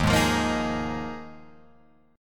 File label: D sharp Suspended 2nd Suspended 4th D sharp Guitar Open F; strummed; JGuitar